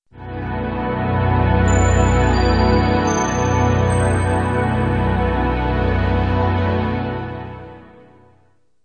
soft

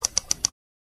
click

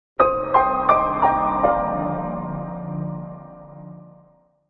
jingle

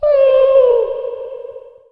monst

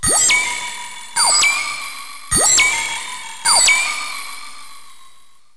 monst walk

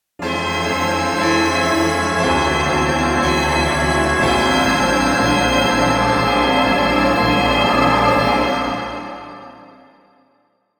soft

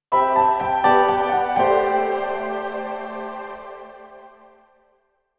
jingle

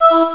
cancel

